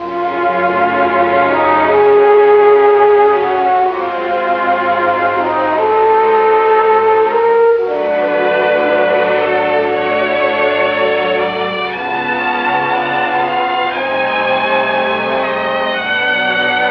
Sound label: horns strings tragedy strikes, sad loops